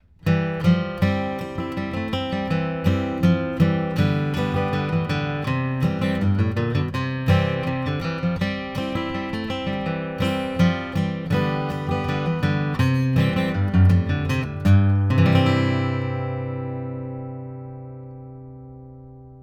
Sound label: ACC GUITAR FET